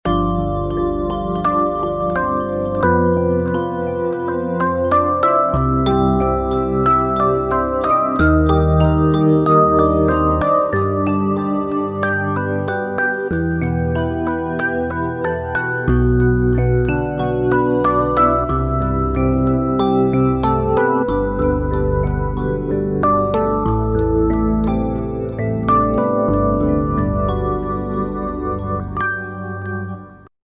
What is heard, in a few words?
Voxy P, Roland V-Synth; Factory Patches